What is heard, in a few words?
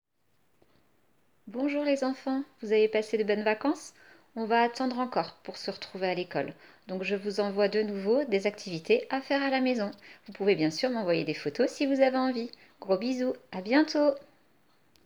Vocal